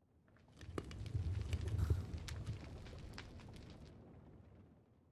烟熏炉燃烧声

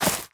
根踩踏声

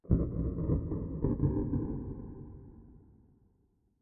下界荒地点缀声; ambient

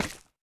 树脂 踩踏声

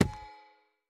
錾制书架 插入 附魔书声

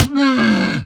骆驼死亡声

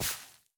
刷子刷沙砾声

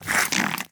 狐狸被激怒声

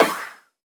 旋风人格挡弹开声

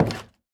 樱花木门开关声

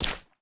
树脂 破坏声

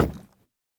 竹木悬挂告示牌踩踏声

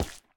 树脂放置声

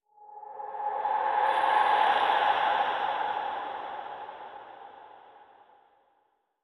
灵魂沙峡谷氛围声; ambient

